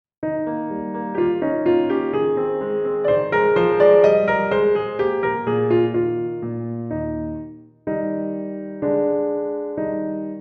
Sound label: Sonatina Accompaniment